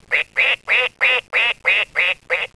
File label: Duck; BUDZIK METALOWY Z GLOSEM KACZKA